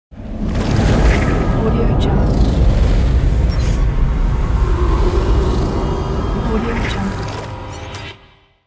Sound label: ultimate magical spell